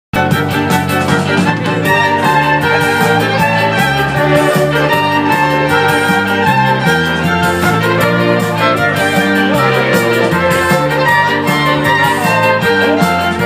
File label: slovak folk playback